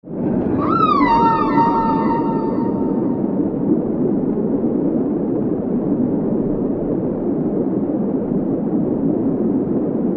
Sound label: underwater background atmo